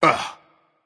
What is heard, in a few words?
grunt attack; smite; vamana